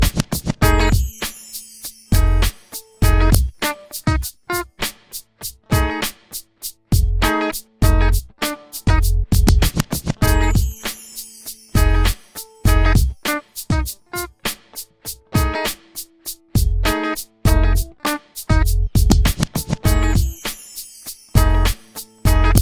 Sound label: G Funk